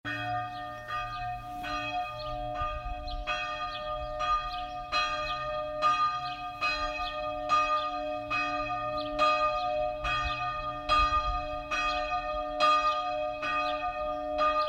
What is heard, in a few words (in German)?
Glockenton Nikolauskirche